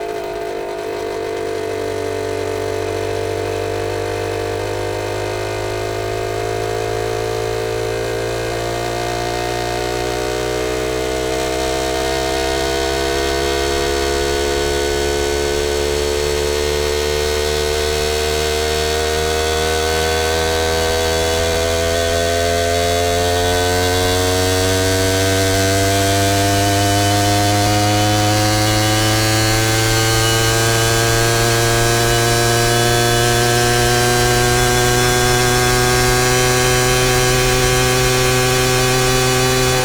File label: Keeway On Board Drive Speed Up Slow Down Shutdown Engine, TX50 Moped